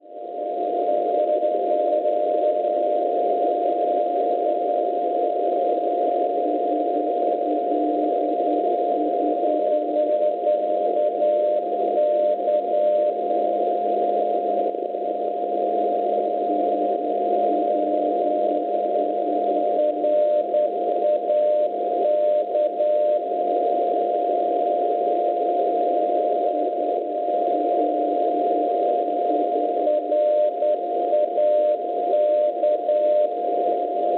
SLV NDB La Sauveniere Belgio 386.5 kHz; Schwarzsee

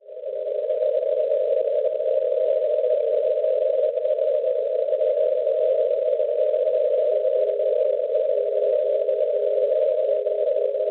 NDB, Rodez Francia 302 kHz; ROM; Schwarzsee

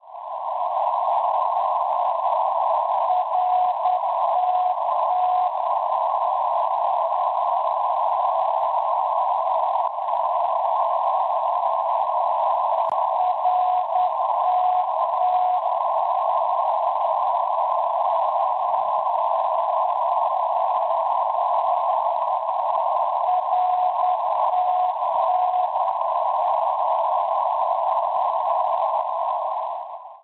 NDB, Rattenberg Austria 303 kHz; RTT; Schwarzsee

